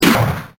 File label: minigun start